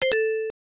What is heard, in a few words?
Ping